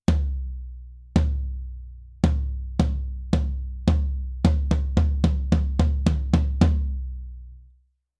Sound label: floor tom; lewitt; mtp940 cm